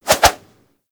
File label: Staff Swing Spin; Warframe